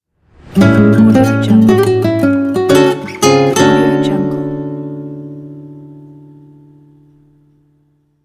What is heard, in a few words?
jazzy guitar logo